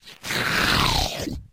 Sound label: cow lick lips slurp; Left 4 Dead 2; smoker